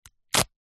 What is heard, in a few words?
foley clothes running shorts polyester rip